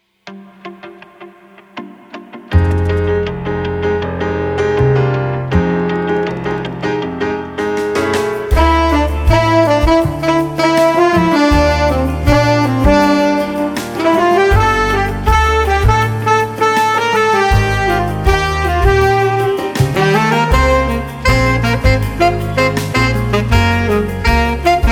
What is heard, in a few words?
SMOOTH BOSSA